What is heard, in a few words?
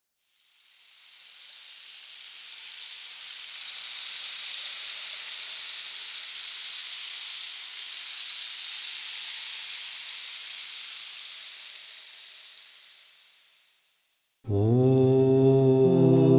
Do major, crystal bowls; Yoga and sound